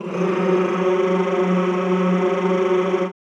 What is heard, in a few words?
CHO Rrrr F#2, Roland; Sound & Vision; Gigapack I CD 2; CHO Mmhh + Rrrr